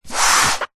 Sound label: household book thick hard back cookery slide table; zapsplat